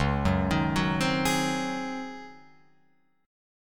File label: C sharp Major 7th Suspended 2nd Suspended 4th Guitar Open F; C#M7sus2sus4 arpeggiated; JGuitar